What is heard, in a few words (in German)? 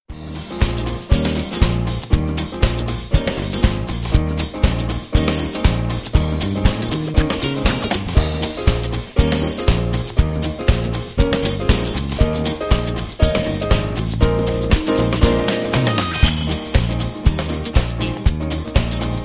Playback ohne Blaeser